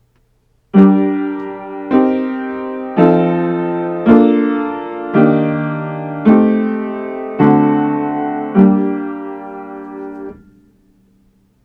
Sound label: Harmonizing with 7th Chords Aural Training exercise audio